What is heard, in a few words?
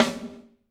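snare drum, Drums; Bob Clearmountain Drums I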